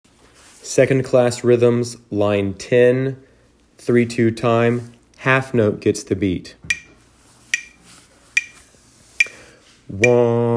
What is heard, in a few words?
rhythms